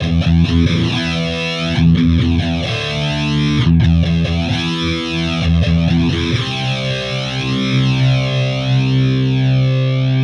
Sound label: triple C plus, amp samples